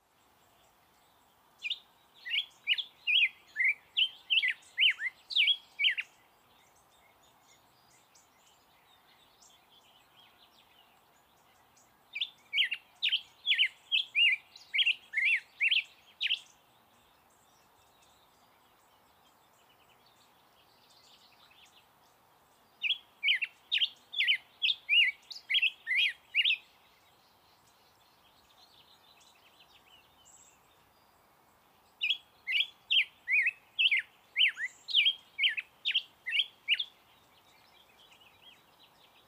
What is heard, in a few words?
Black headed Grosbeak Pheucticus melanocephalus